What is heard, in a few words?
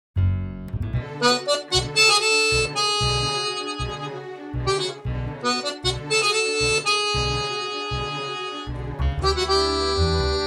Tango Nuevo